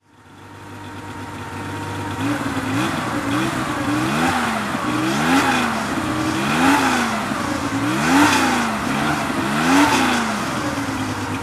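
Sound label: porsche 911 22e throttle, engine sound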